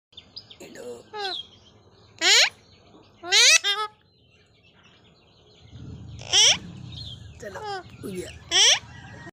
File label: Alexandrine parakeet; شاه طوطی الکساندر